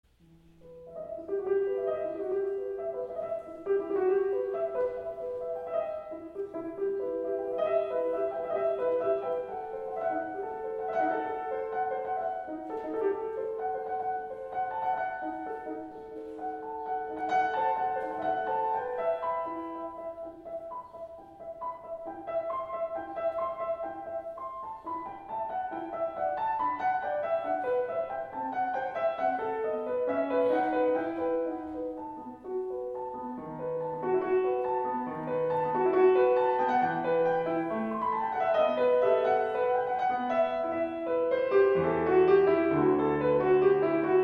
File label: piano; Rameau